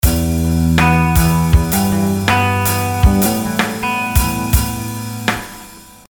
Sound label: loop drum